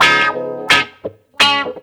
85.4 GUITAR

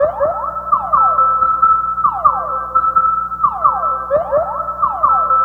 Sonar FX; Sonic Foundry (Sony Creative Software) - Stylus Pressure Urban Grooves on Digital Wax; Matrix Z

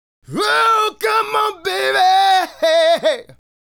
Male Solo, Hip-Hop and RnB Vocals

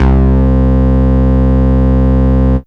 Plastick Bass C3, Fairlight CMI IIe

